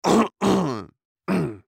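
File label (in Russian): zvuki pershenie v gorle kkhm, sounds tickle in the throat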